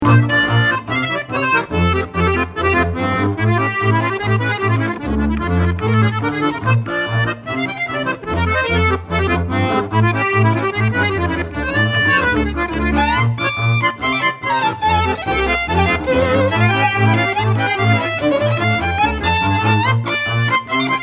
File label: Fanfara; Suceava